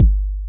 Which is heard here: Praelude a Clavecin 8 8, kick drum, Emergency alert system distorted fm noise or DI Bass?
kick drum